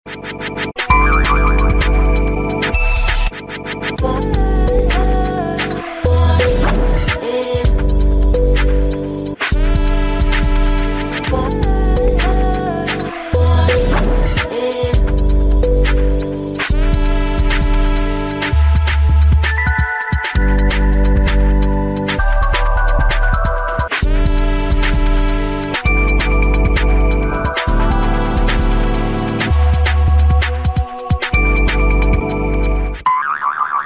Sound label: Jazz